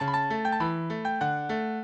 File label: minuet, mozart